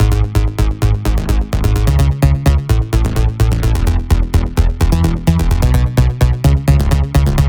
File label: Melody Kits 128BPM Root G#; Vengeance Samples